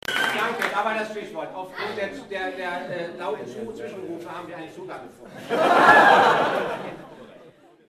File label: Cello